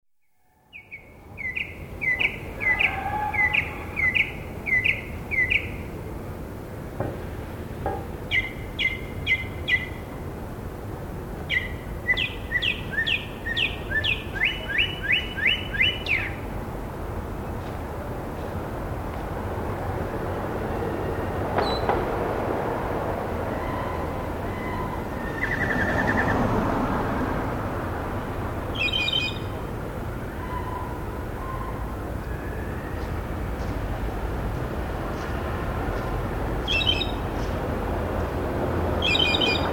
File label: New Orleans: House Finch at night, with background traffic; Outdoor Sound Effects; BBC